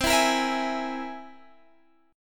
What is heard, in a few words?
C Augmented 7th, Guitar, Drop D